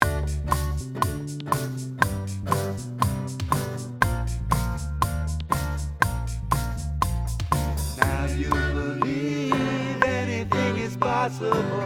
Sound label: Up Tempo w Piano